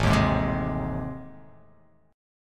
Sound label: A Minor Major 7th Flat 5th